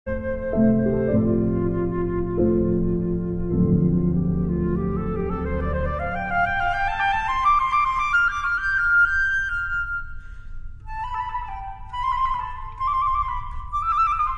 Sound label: piano, Pastorale for Flute and Piano